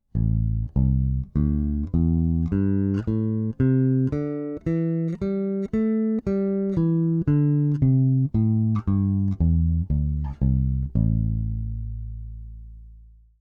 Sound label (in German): Big Blade Both PU aktiv; Nordstrand